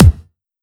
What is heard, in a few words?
normal hitnormal; osu!